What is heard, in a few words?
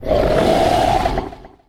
Roar; Mauler